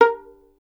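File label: STR violin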